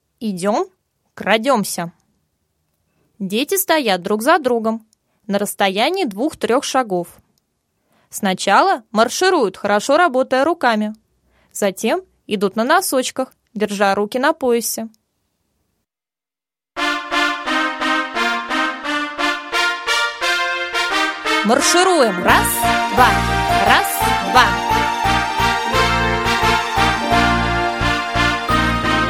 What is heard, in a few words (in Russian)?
Ритмика для малышей